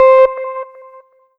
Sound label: M-Audio Venom Sleepwalk C5